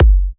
F# kicks; markus hakala kicks

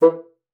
bassoon, E3; Xsample VOL-6

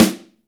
snare, Roland; Club-50 - Foundations Roland; Ext.Snare

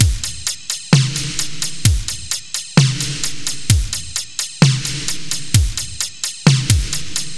Beat Basics; Zero-G; Future Wave; Beats

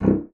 C1, bassoon; Xsample VOL-6

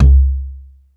DEEPER, Bass Analog & Synth, C2; USB Soundscan vol.12; AKAI; VINTAGE

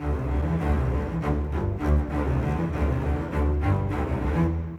Bass Cello, Orchestral; Sonic Foundry (Sony Creative Software); Orchestral VOL-2

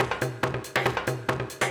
Perc Loop, Club Techno, 140 BPM; Zero-G; Construction Kits

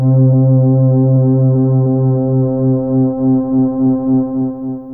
Ensmbl C2, Fairlight CMI IIe Keyboards; Keyboards2

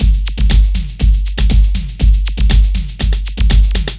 LOOP120; Sounds Good - Eurotech

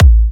Kicks A; markus hakala

